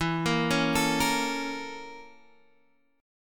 Bb Minor Major 7th Flat 5th

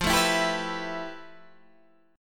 F Major Sharp 11th, Guitar, Standard; strummed; JGuitar

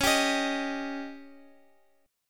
Db Suspended 2nd Flat 5th, Guitar; strummed; JGuitar

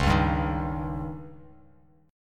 Db Suspended 2nd Flat 5th, Bass Standard; strummed; JGuitar

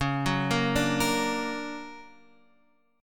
D Augmented Major 7th, chord

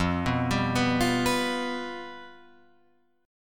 F Suspended 2nd Flat 5th, Guitar, Open F; arpeggiated; JGuitar

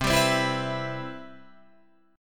C Major 7th C down

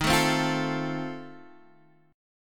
F 7th D sharp

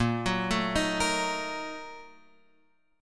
A sharp Major 7th Suspended 4th Sharp 5th; A#M7sus4#5 Chord {6 9 7 8 X 6} arpeggiated

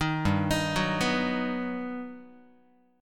G Augmented Major 7th, Guitar; G+M7 arpeggiated; 6,3,6,4,3,x; 4A,4E,5A,5D,5G#,6C#

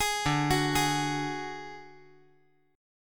D Major Flat 5th D, chord

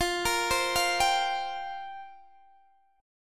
F Suspended 2nd Suspended 4th, Guitar DADGAD

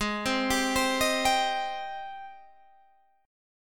Ab Major 7th Ab down Guitar Standard; AbM7 arpeggiated; JGuitar

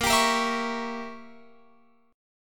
Bb Diminished 7th Bb Guitar Open F; Bbdim7 strummed

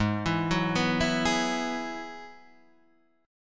Ab Minor 7th Sharp 5th Ab, Guitar Standard; arpeggiated; 4,7,4,4,5,4

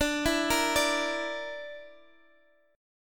D Suspended 2nd Sharp 5th D Guitar Standard; x,x,12,9,11,10; arpeggiated

